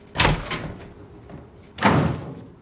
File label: Open And Close Door Hard